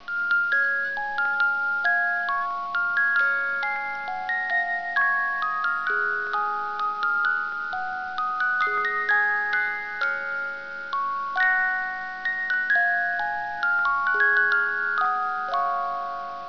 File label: melody